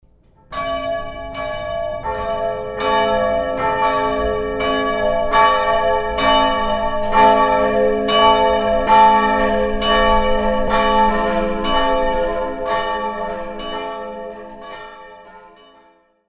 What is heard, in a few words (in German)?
Glocken